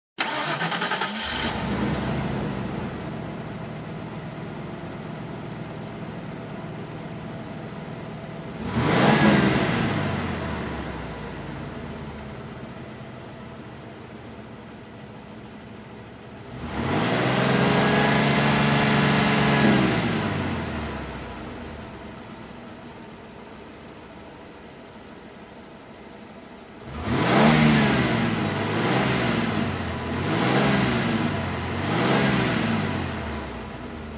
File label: Car engine ignition and rev up